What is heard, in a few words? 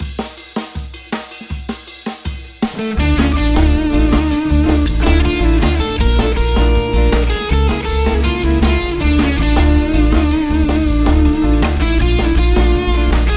hungarian minor